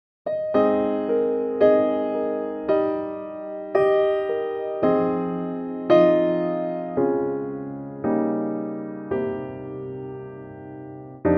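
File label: Ab Piano, male; key - Ab - vocal range - Ab to Bb; wonderful ballad piano arrangement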